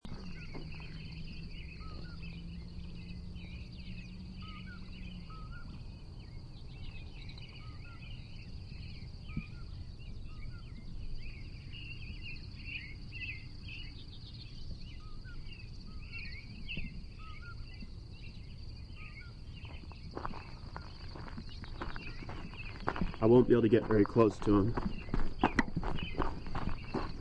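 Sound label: Common Poorwill poorwill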